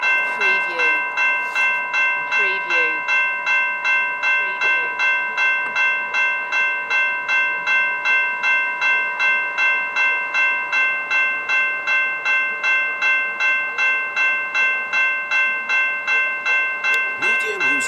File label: Train Warning Bell